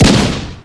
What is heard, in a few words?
Rocket Barrage target